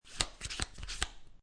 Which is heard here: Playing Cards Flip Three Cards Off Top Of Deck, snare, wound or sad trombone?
Playing Cards Flip Three Cards Off Top Of Deck